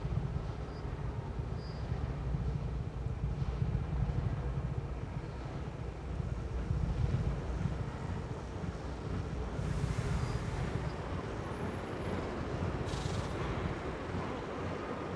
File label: Ambiance Hub Scientists; SandBox Musics